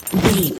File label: foley soldier gear equipment metal cloth heavy movement strong